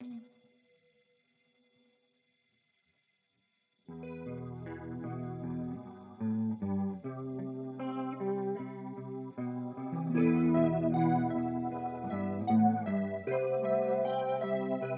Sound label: tremol